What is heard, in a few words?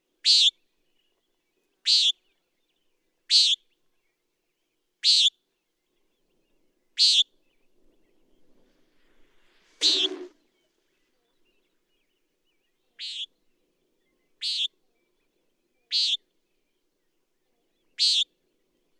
Common Nighthawk